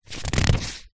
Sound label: household book large thick page turn finger thumb rub